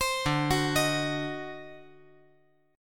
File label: D 7th Flat 9th D down, chord